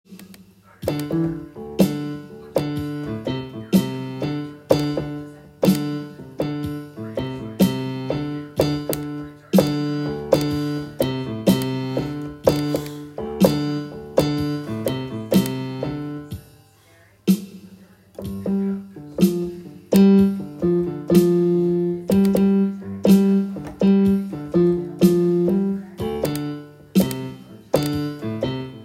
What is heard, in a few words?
funky five blues